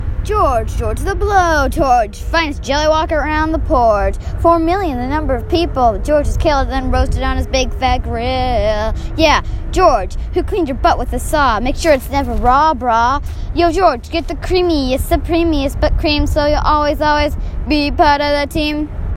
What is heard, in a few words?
Rap